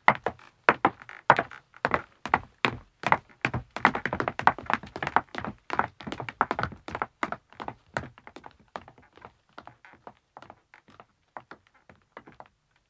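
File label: Footstep wood descending, 1 man 1 woman depart; Part of Footsteps (Sound Effects:Human); BBC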